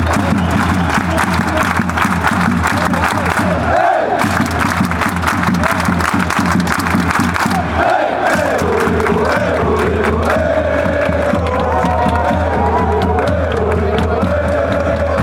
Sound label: ohhh ohhh, chants